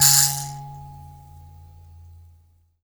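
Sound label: HI KALIMBA C#3; Heart of Africa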